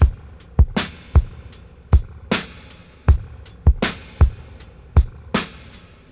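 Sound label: 78 break FX, drum loops, dub; Drumdrops In Dub VOL-1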